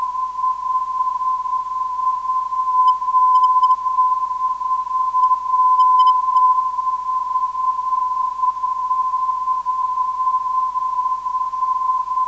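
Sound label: r 509khz, non-directional beacon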